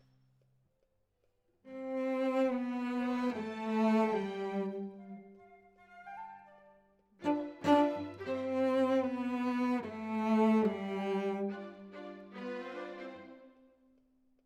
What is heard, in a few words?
Cello Solo